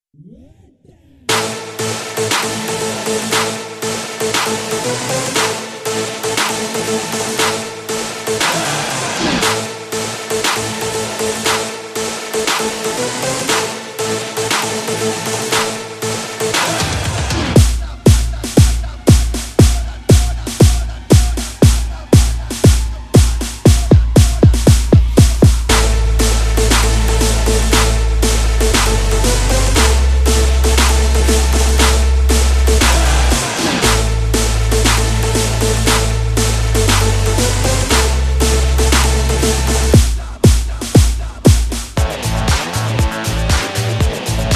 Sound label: Vetta Karaoke; Singam 3